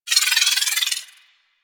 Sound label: Pistol Proj Whiz, weapons; Warframe; Thanotech